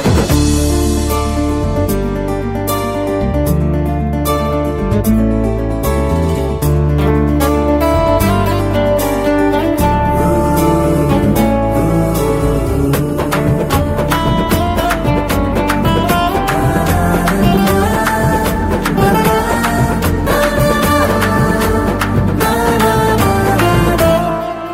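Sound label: BG Music Telugu Ringtone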